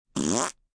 Fart Opening, Mouth effects, fart, short, ascending (human voice); Sound Effects:Human; Voice Box